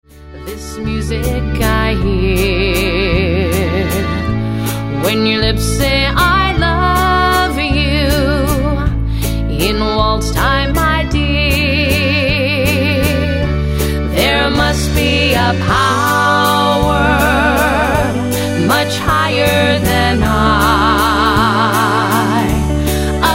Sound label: Waltz